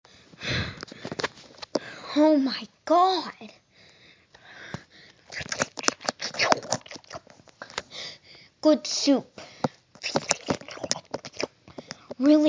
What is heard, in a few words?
slurp funny